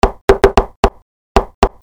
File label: DOORS